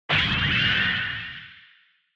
Retro Anime Robot Low

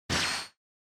Motion Agility Short; モーション・俊敏

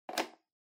Megaphone Hardware Mic Holder Put Back

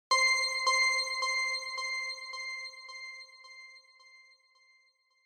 Single Accent Delay

